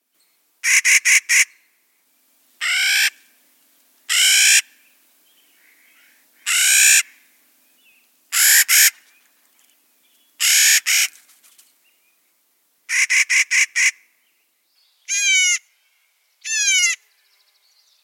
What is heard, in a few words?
Clarks Nutcracker